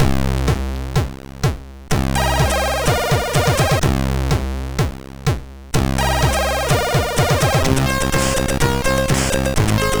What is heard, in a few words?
Frogs Sea, sid MOS6581R3